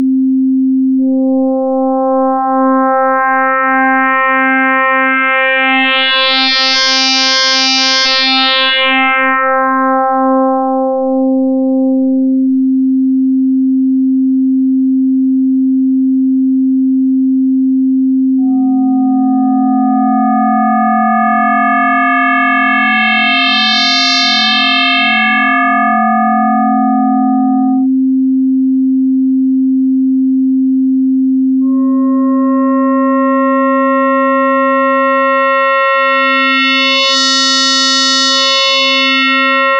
sine sine fm different ratios; cyclebox

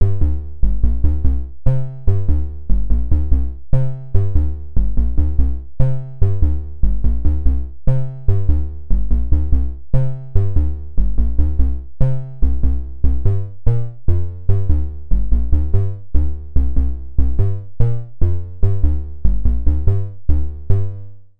sine pm fm bass; cyclebox

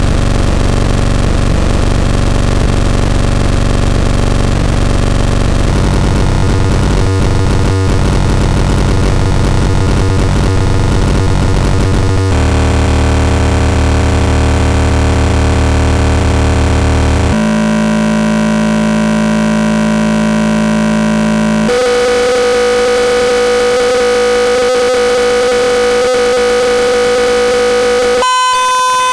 cyclebox tri sine chaotic octave pitch variation

